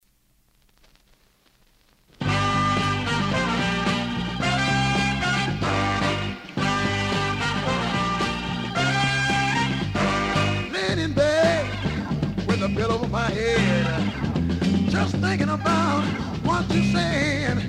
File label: LAUGH; Revue